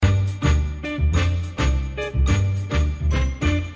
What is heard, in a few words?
Tamagochi Sms